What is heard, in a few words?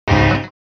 Electric guitar heavy pluck